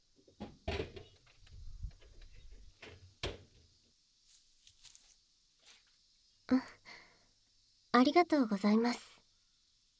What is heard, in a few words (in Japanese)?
耳舐め手コキ SE小; SE小さめ